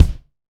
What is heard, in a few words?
Room Kick; Roland BK-5